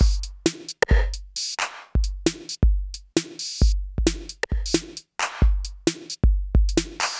Old School Break, Drums

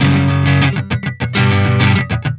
Electric Guitar, Loops; Guitars

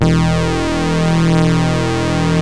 sync lead, yamaha rm1x synth; bass and lead

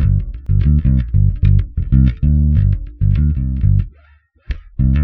Electric Bass; Basses

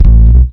BASS; SoundFactory

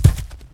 rockfall; theGoonies; Retro

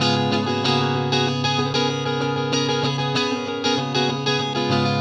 Acoustic Guitar; Guitars

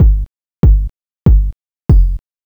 Kick Loop, Drums, Dance Beats

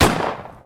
attack; theGoonies; fratelli

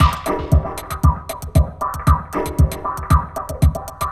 Electronica Drumloop; Drums

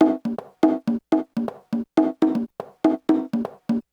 Acoustic Percussion

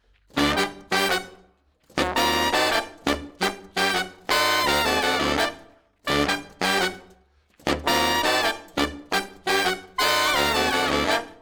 Horns Section

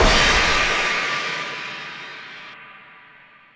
Cymbal One Shot; Drums

